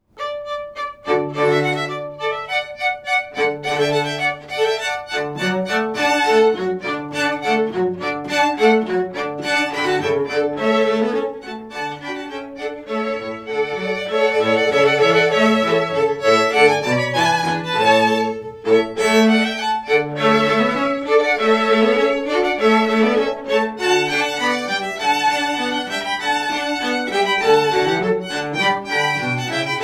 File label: Strings Fireworks Music